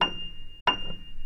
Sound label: F7 piano, pianosound